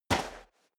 step boots sand single left

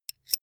bullet scrape light; krieg-waffen; geschoss

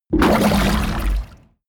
kraken growl short; kreaturen

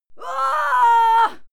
female fight scream; schreie; menschen

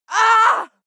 female fight shout pain

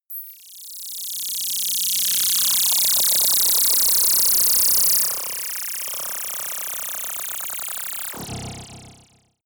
splutter noise long, sciene fiction

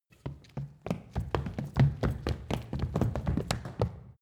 step group small run stop wood

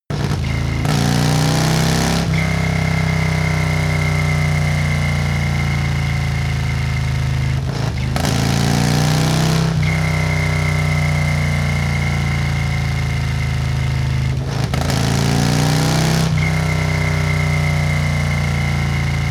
motorcycle harley accelerate triple whistle; motorraeder; verkehr transport

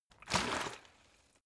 bottle water sparse upend; berufe

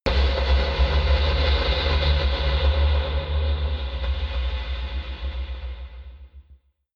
noise impact rumble; impacts; hits-explosionen

